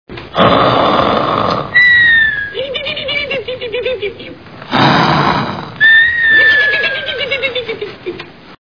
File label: snore; three stooges